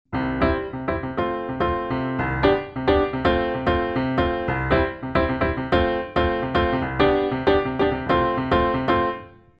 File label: Reason 10 Radical Piano Hammer piano; Fest